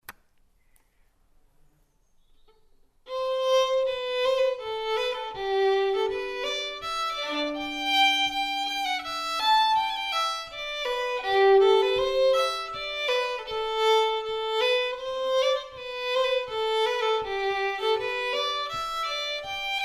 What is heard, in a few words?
Polka, fiddle; Ballydesmond Polka no. 3